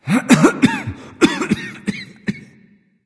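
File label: nonverbal clear throat; smite; voicepack; ullr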